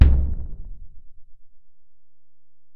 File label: Ac BD, Alesis DM-PRO; 300 Drum Machines